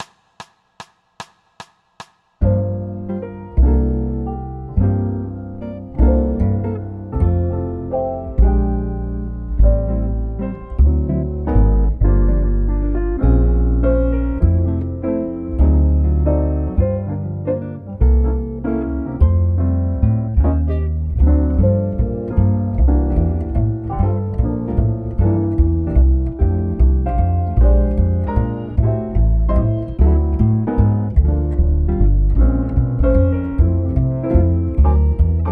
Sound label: drum set play along, waltz, jazz